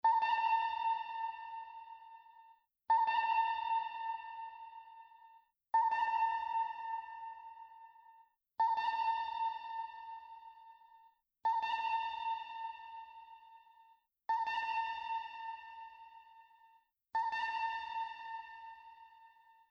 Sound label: Sonar